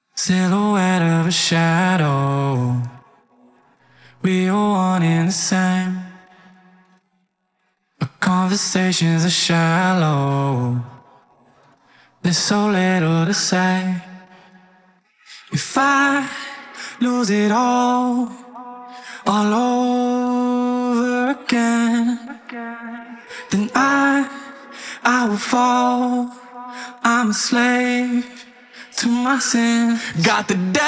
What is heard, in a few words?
vocals